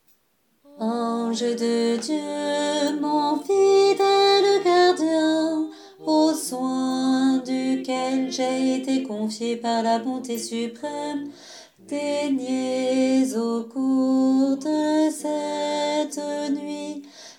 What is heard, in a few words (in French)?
alto; priere a l'ange gardien